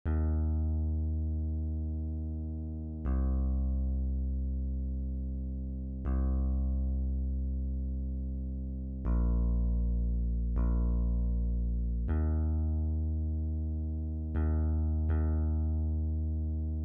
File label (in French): Guitare basse